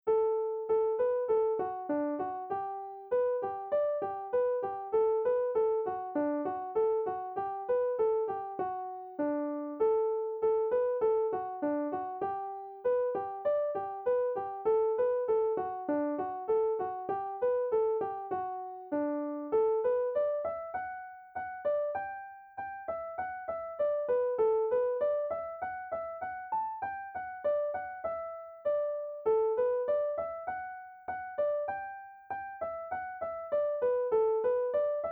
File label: slow; rolling in the rye grass